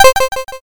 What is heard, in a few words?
cancel